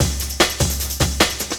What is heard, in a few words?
LOOP, Drum & Bass, 150 BPM